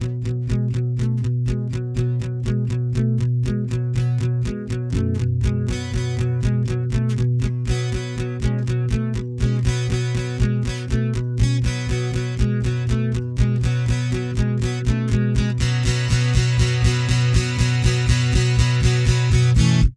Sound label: Rock Background